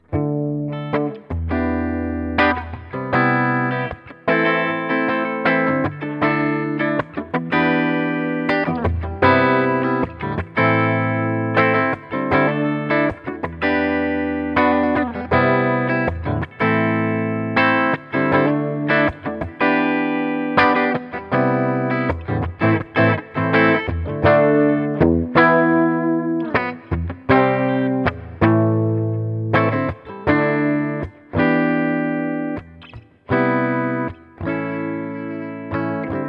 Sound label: Clean 6V6 Bridge Pickup